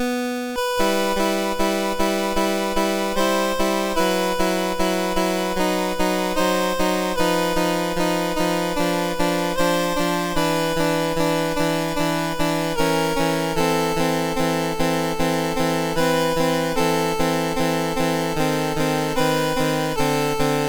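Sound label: Prelude in E Minor Op28 No4, MOS 6581 SID chip; MOS6581R2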